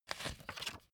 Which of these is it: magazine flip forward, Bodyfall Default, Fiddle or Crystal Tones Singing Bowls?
magazine flip forward